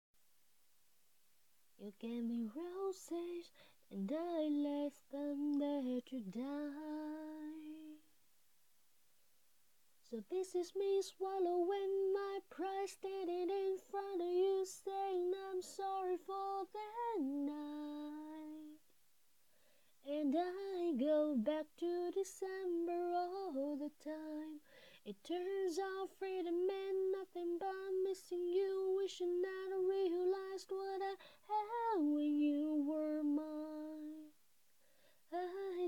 英文歌

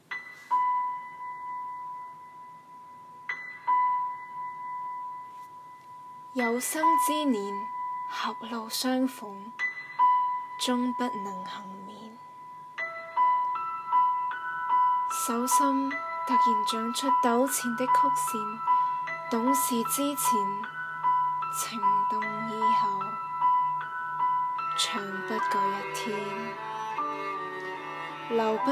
粤语歌词

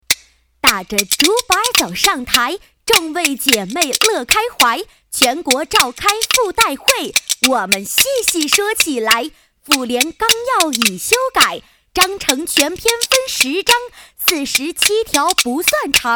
快板

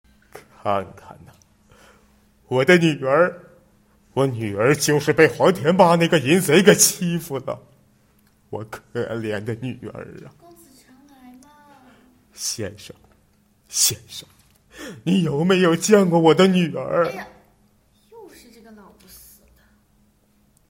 受辱声

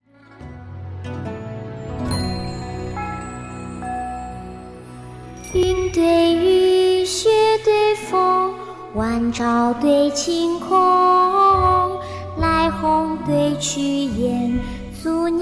歌曲童声成人

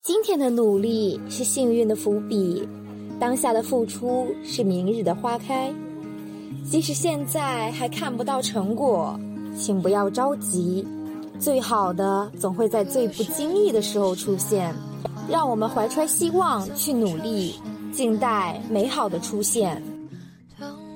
伴奏

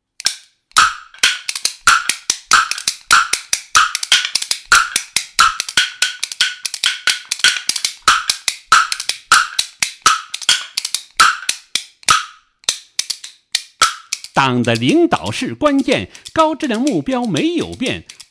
快板

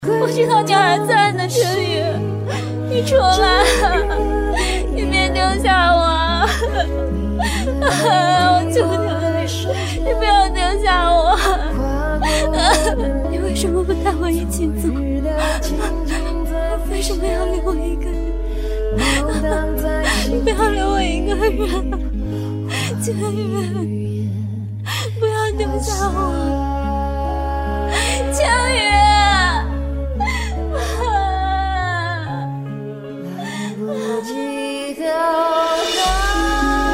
绝望大哭

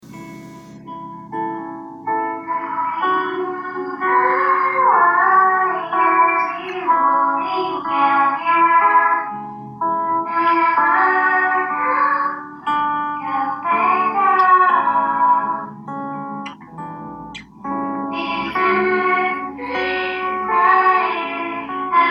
翻唱片段

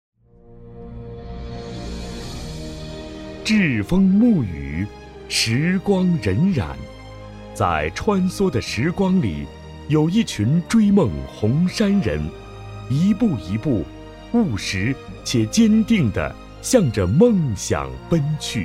配乐; 栉风沐雨配乐

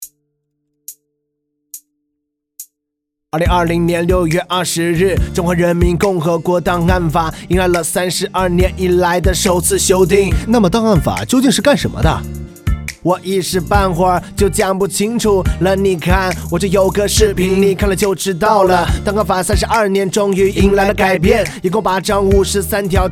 作曲

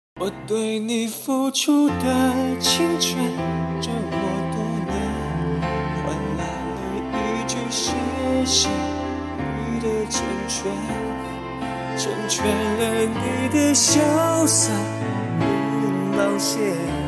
伴奏版

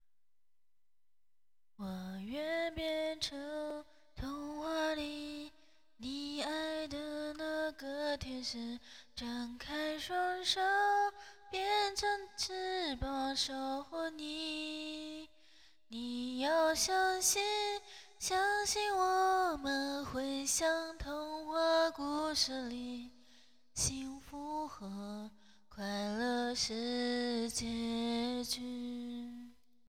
歌曲童话

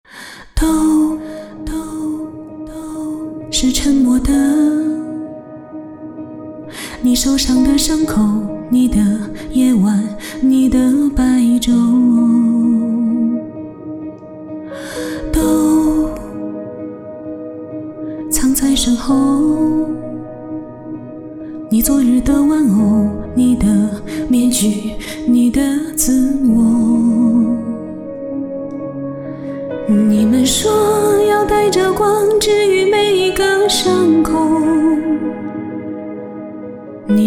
改词翻唱

